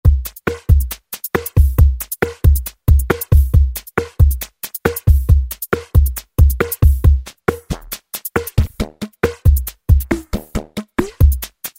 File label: Hard Break KIT, Roland V-Synth; Factory Patches